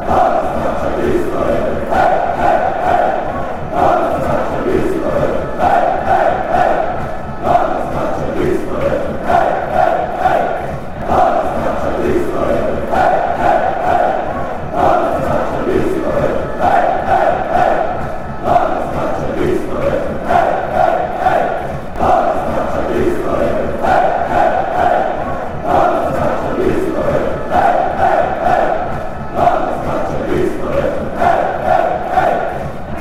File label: slovenia chant